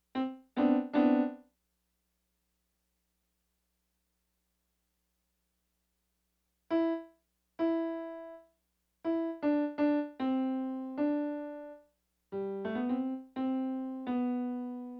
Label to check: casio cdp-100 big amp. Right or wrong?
Right